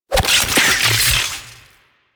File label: Fishmonger Cut Bait; DE; Warframe; Fortuna